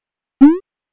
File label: jump; Pinocchio 1995 SNES; gamesoundopedia